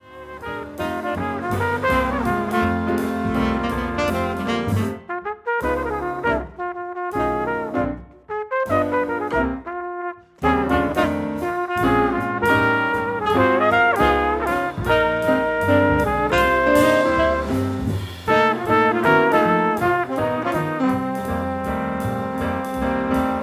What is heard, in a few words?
Quintet